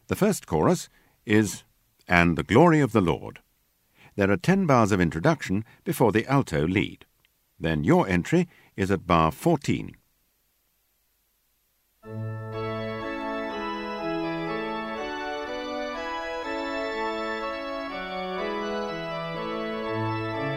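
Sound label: with Singer Soprano; Messia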